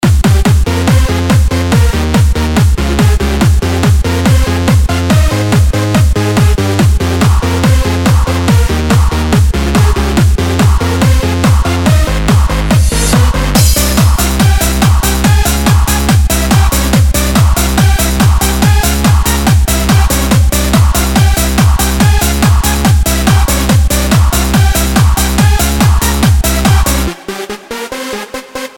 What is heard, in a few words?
disco